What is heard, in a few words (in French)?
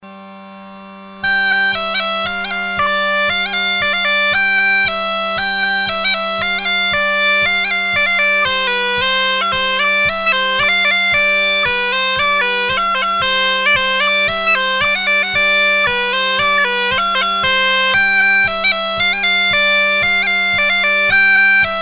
polka; pere machin